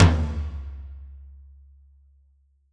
Korg N1R Low Tom

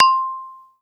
Casio CZ-5000 Calimba C6

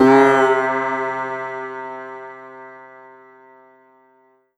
Ensoniq ZR-76 Sitar C3

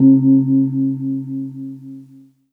Roland R 8 Thai Gong

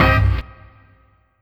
Ensoniq ZR-76 Hop Hit

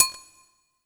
Kawai K5000W Agogo C6

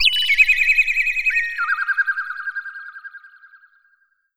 Roland JV-2080 Birds

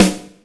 Korg TR Rack Standard Kit Snare Drum

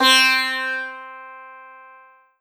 Alesis Fusion Sitar C4

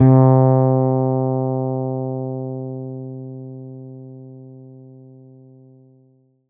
Roland SC-88 Fretless Bass C3

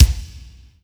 Korg M3R Kick

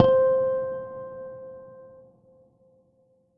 Roland U-220 Bass Harmonic C7

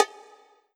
Korg N1R High Bongo

